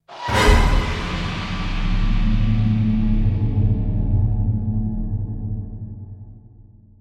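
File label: sounds of endings outro, disappearance fading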